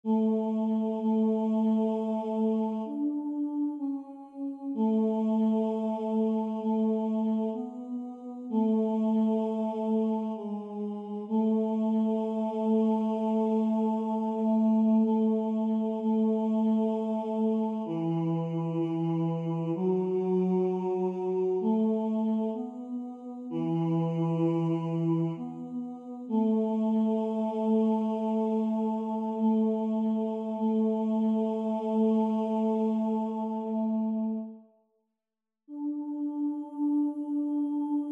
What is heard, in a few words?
Couperin Tenor; Jod